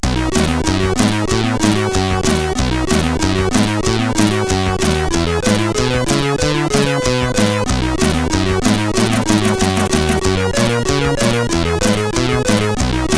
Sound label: sid CSG8580R5; In Da Moon